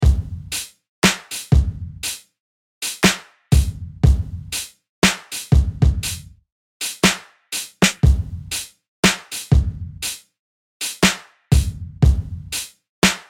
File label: Hip-hop